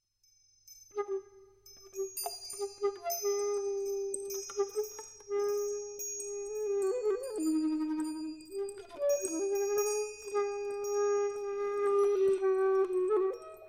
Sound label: flute and percussion